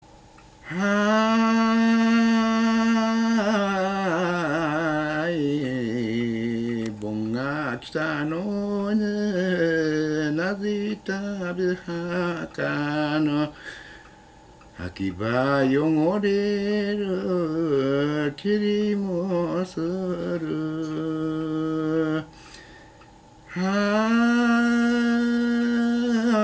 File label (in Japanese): bonodoriuta, minyou